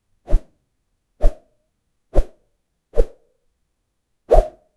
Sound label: whoosh fast not loud